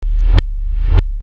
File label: Reverse, baesse